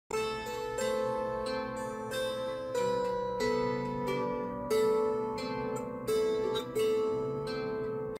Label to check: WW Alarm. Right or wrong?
Wrong